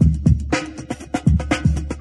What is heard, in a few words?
drum loops